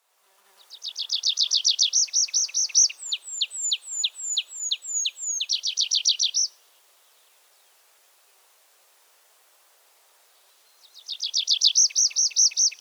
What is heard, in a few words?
Anthus trivialis song short Baumpieper; Klaus Conrad; Tierstimmenarchiv des Museums